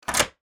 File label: Door Unlock Office